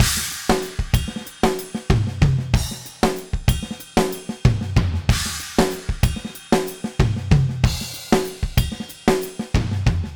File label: Heart Samba, Latin Percussion, Drumset; Sonic Foundry (Sony Creative Software); Joe Vitale Latin Percussion